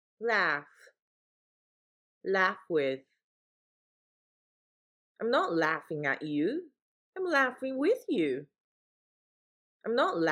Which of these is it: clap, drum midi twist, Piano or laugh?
laugh